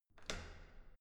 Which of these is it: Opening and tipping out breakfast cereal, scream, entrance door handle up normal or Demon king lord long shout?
entrance door handle up normal